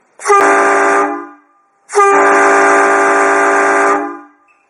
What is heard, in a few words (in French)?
son 3 trompes acier sans cycle